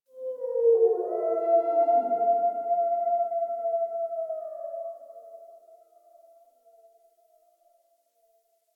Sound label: Exterior Distant Calls, Ambience; Warframe; Duviri